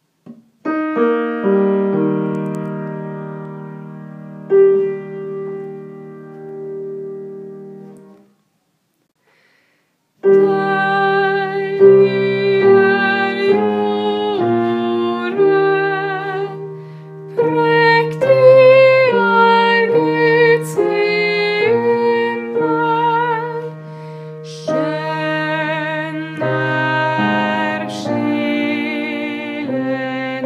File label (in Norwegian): Tenor